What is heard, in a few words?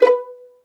B4 PIZZ, Violin; Q Up Arts; Denny Jaeger Master Violin Library; PERFORMANCE